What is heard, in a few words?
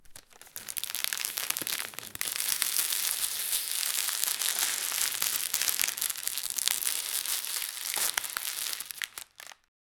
crumpling cardboard; objects